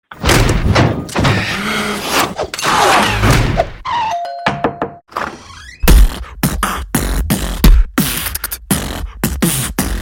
zvuk bitboksa

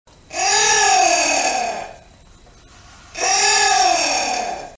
murav, zvuk muravjeda

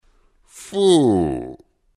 fu

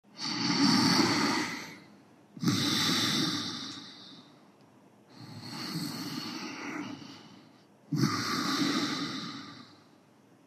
hrap

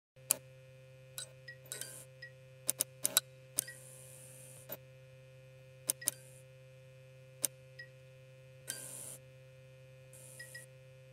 mercanie lamp

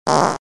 puk cheloveka

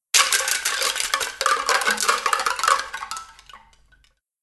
plastikovye stakanchiki upali

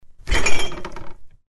otkr dveri holod s butylkami; Включение, шум работающего холодильника и выключение

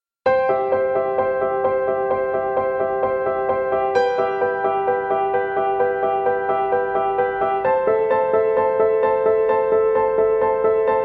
muzyka dlya instagram

